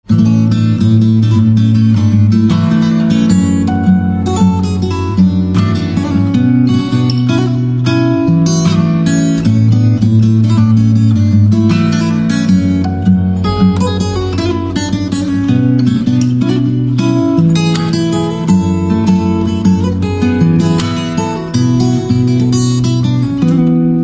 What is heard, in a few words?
Guitar, instrumental ringtone